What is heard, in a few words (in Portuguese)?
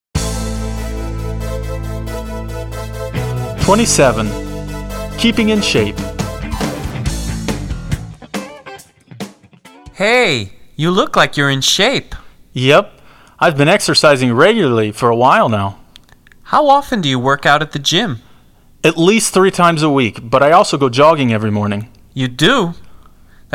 Trilha